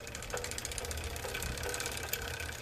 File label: Bicycle Loop Pedal